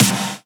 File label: Snares; Vengeance Samples; VEH2